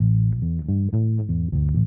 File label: bass lead